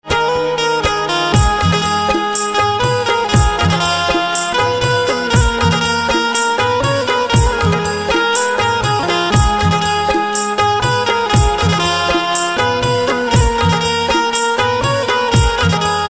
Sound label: Banjo Instrumental Ringtone